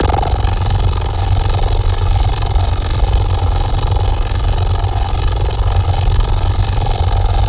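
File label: F minor Ghost Army bass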